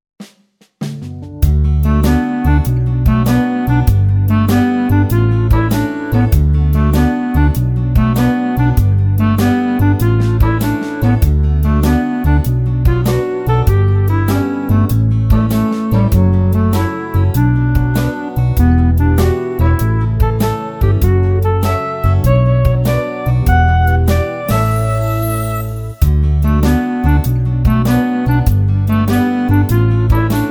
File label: a peaceful town today